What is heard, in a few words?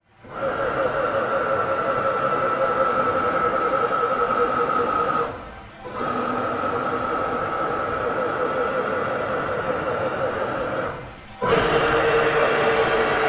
Isolated Robot Sounds